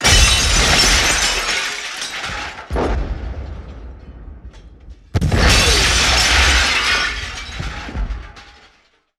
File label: Explosion with glass shattered